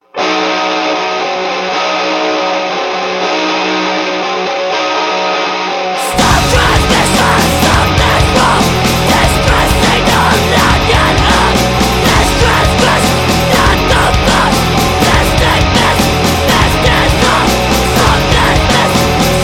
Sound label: Sad purgatory